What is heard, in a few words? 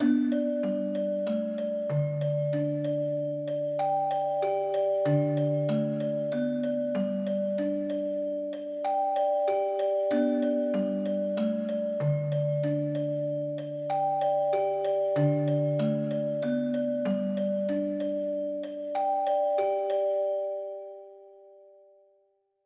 Chiming Out